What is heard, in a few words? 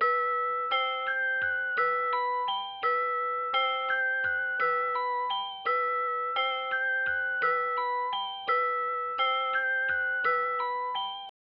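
85 BPM E Min Vibraphone, loop stems; Cymatics; 2022 Melody Collection Platinum Expansion; Vibes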